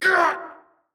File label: Warrior Grunt Hit; Archangel; Realm Royale